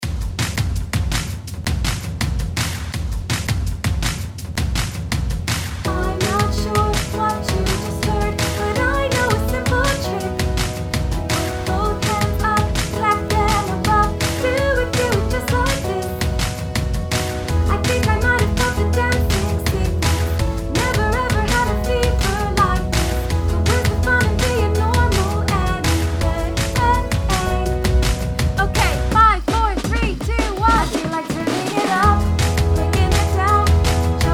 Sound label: make some noise in E vocals